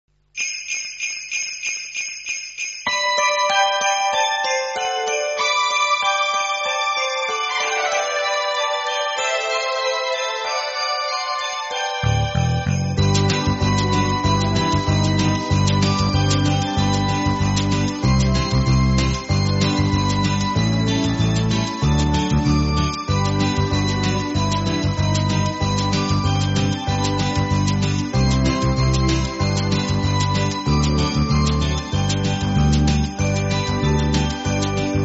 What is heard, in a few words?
Jingle Bells; happy new year greeting cards